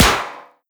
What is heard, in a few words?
metal sheet, bullet impacts; physics; Half Life Model Pack